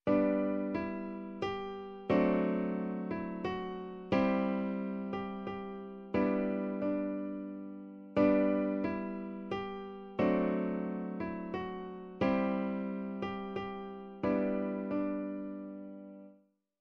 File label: Strum Chord; Grade 5